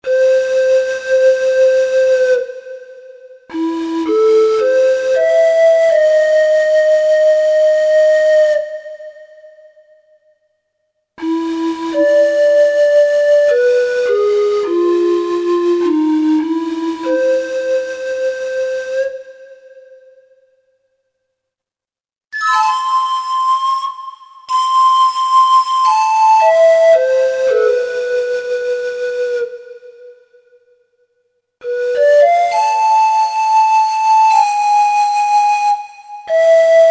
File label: Slo Wood Flute